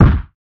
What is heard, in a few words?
Dull hit